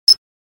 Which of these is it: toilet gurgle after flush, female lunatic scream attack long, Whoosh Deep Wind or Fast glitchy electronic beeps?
Fast glitchy electronic beeps